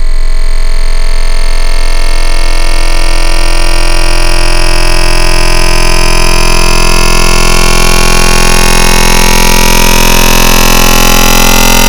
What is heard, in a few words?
Baby Talk, Wavetables; Cymatics; Secrets Wavetables; Serum